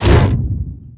Leap land; Evolve; Goliath

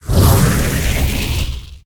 Flame Breath FLAME; Evolve; Meteor Goliath

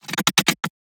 Emote; Evolve; Gorgon

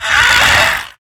Web Sling; Lurker; Evolve; Gorgon

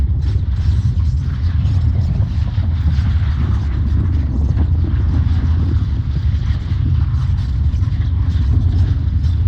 Lightning Strike Aim Loop; Evolve; Elder Kraken

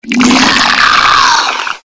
skeleton bat; Skeletal Ravager

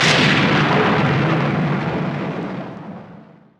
Lightning Strike Fire Strike; Evolve; Elder Kraken

